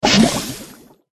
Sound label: murena attack voice bottom